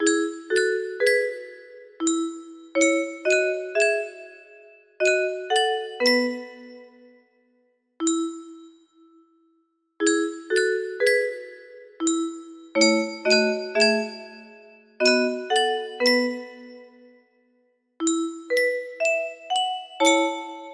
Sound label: music box; Tre uomini e una gamba